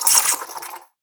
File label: Coffee Machine, household rhythm samples Hits